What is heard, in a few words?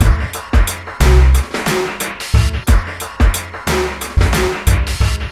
Jazz Drum Beat